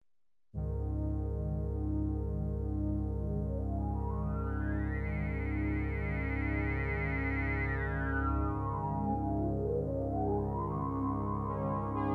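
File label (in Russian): синтезатор магическая мелодия медленный темп мягкое прозрачное звучание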